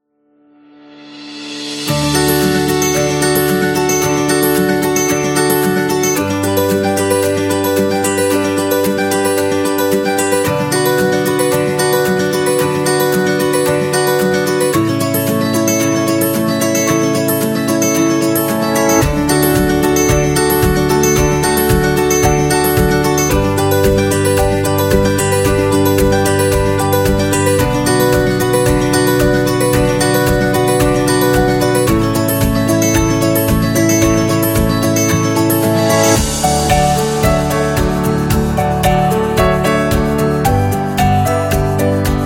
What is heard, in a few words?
Chill Acoustic; happy